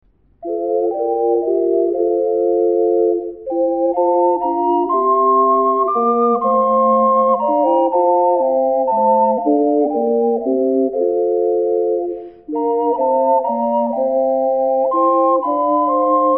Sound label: lullaby, ocarina